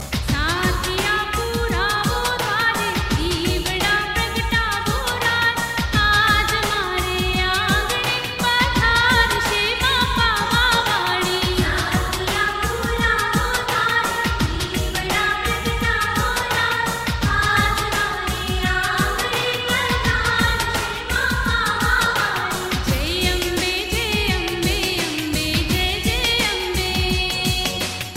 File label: Garba; Sathiya Puravo